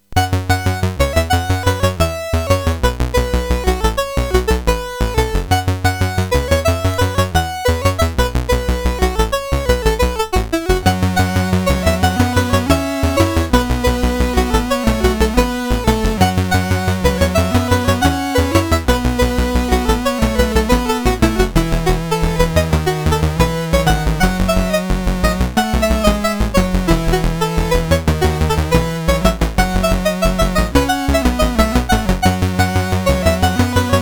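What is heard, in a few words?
Pocket Rockets, MOS 8580 SID chip music, games; CSG8580R5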